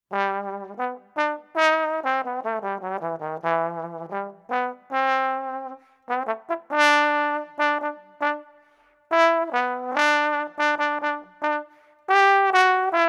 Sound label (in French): trombone a coulisse